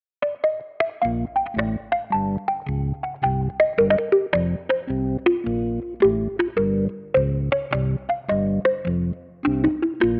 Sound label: Magic Perc